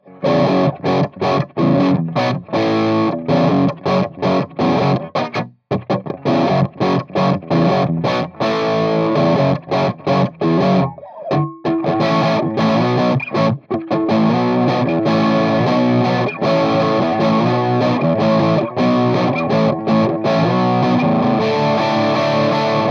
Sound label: wgs et65 crunch; laroqua 212 studio test